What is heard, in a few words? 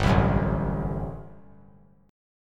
G Major 7th Suspended 2nd Suspended 4th; GM7sus2sus4 strummed